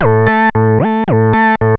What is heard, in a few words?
SYNTH -L, Roots Disco; USB Soundscan vol.32; AKAI